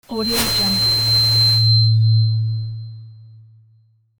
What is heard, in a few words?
tuning glitch